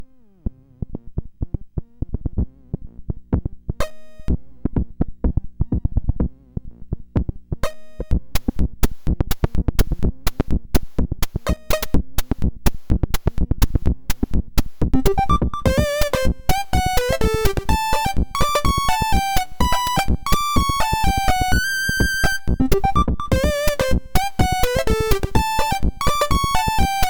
3SID Tracker, MOS6581R2

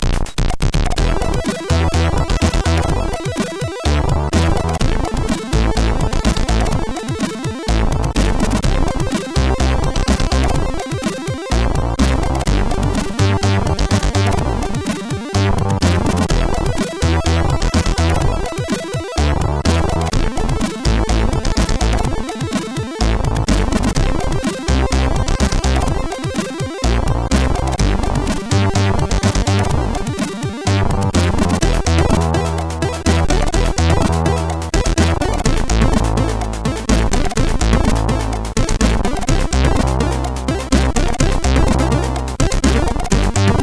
Shortfonk, CSG8580R5; hvsc

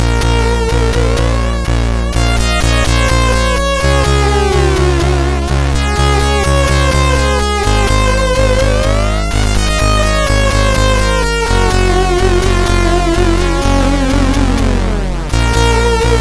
Echo Beat, sid CSG8580R5; hvsc

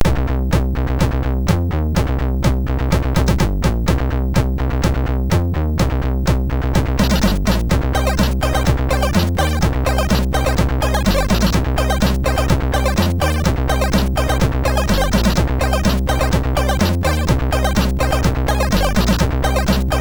Sound, MOS6581R2

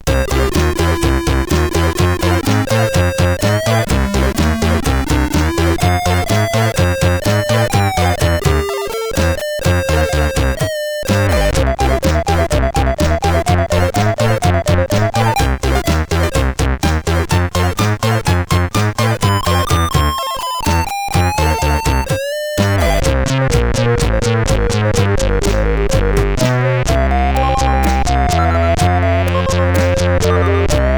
sid CSG8580R5

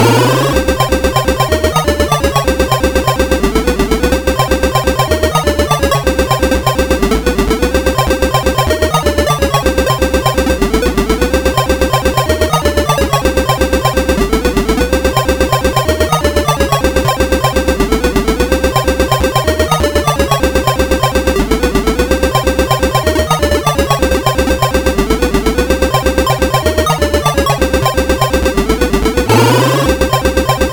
Solomons Key, MOS6581R4

